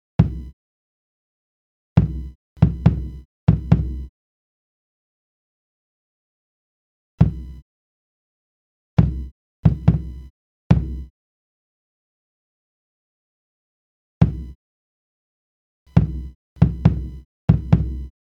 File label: Kick Noise